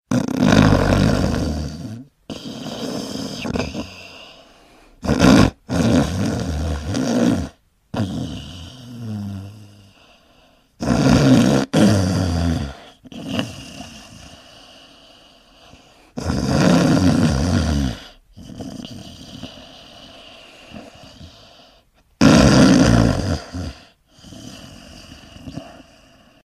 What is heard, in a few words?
snoring sound; انواع صدای خروپف مردانه و زنانه; human